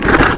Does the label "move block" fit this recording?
Yes